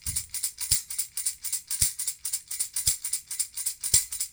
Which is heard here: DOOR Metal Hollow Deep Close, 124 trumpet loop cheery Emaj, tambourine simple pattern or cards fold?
tambourine simple pattern